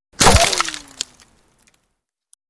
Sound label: Bullet Impacts Wood I